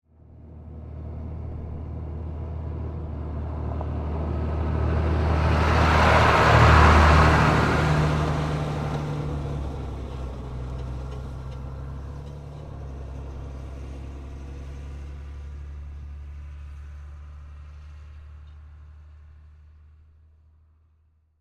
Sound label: Repair Truck, Telephone, Pass, By, Medium; Truck Sound Effects; SoundEffects-BlastWave